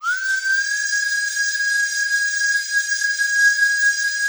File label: Aircrafts F-15; DCS